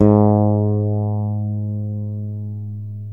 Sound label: FRETLESS, G#